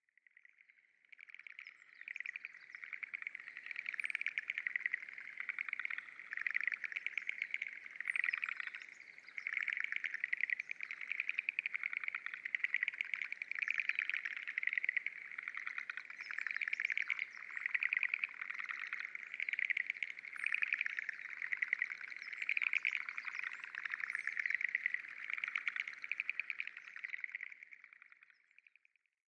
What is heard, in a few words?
Schlegels frogs